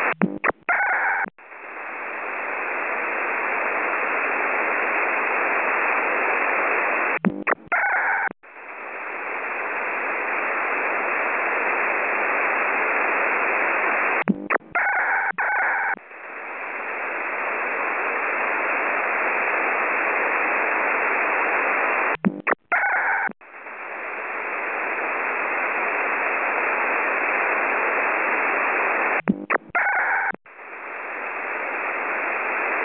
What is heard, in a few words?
pager